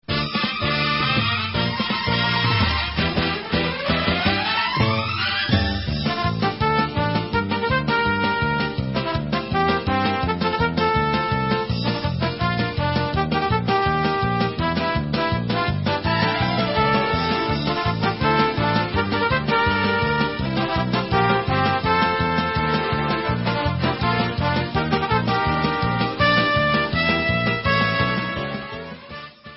Hora Instrumental

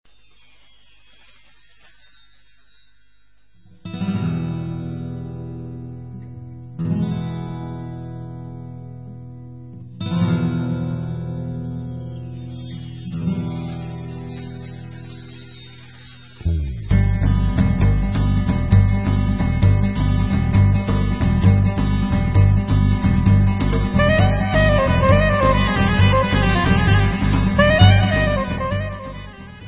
Instrumental

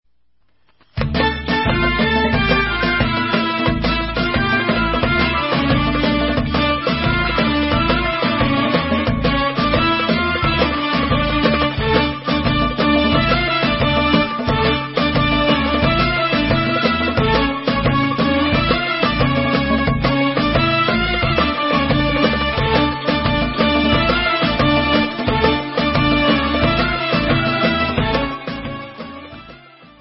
Instrumental

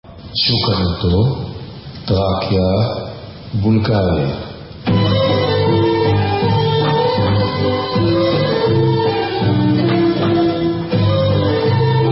Bulgarian Instrumental

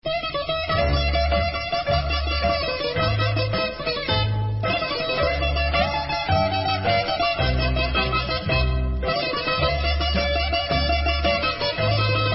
Hasapico Folk Instrumental

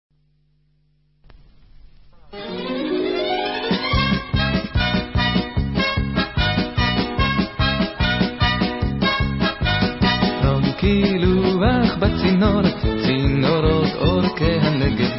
Hora Vocal

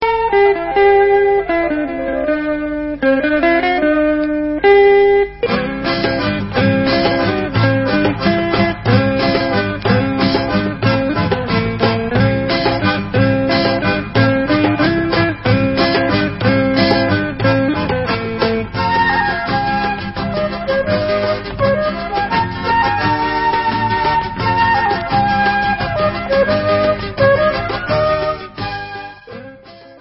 Instrumental

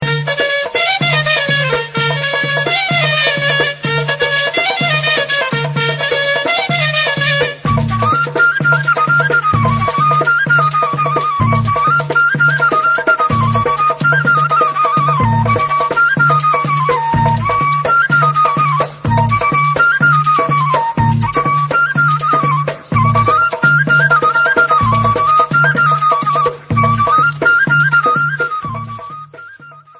Debka Instrumental

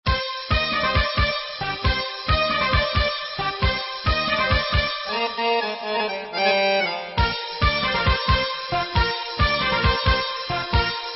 Debka Instrumental

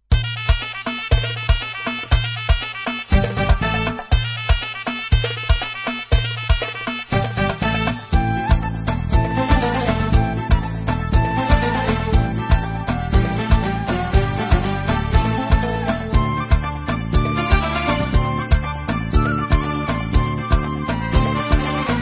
Instrumental

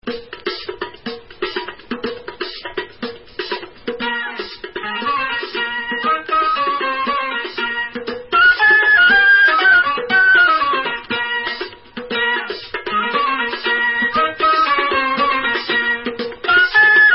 Debka Instrumental

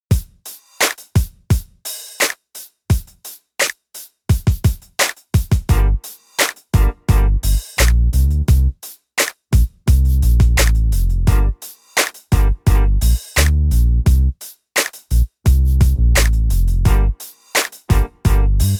southern soul bounce

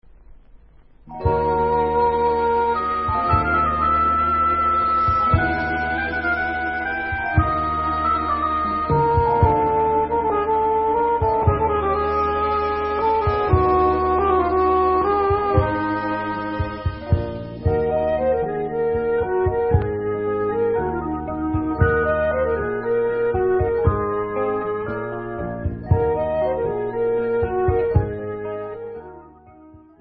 Instrumental

